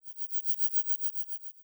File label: Title Transition Delay Short